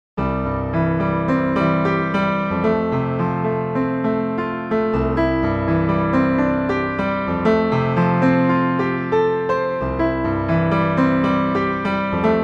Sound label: Equal, Tuning Samples